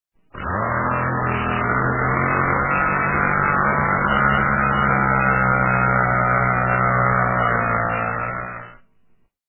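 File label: chainsaw clear sharp close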